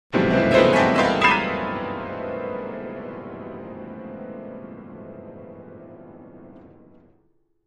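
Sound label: Grand Piano Ascending Chords; keyboards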